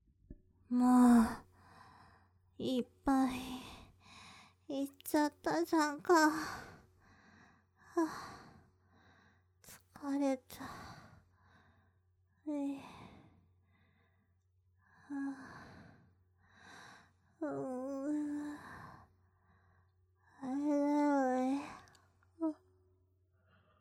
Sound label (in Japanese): 寝息; 罰ゲームでイカされイカされない